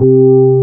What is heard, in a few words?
ORGANUS C2